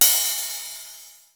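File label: open hi-hat, DrumBase5000; Crypton; CFZ KIT 2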